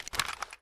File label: mp5 cloth